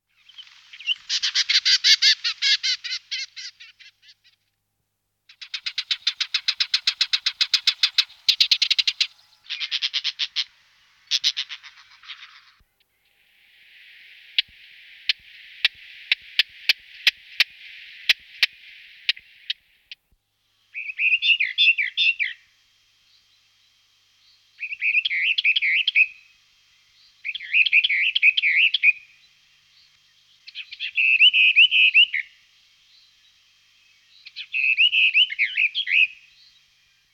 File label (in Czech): Penice mistrovska Sylvia hortensis hortensis